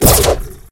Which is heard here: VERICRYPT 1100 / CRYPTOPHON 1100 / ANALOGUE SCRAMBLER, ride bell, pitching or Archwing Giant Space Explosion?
pitching